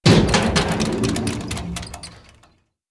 Shaking Metal Gate Slide; Frogsong

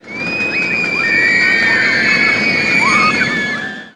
Scream

